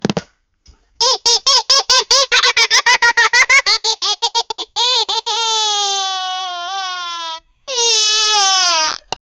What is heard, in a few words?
kid laughing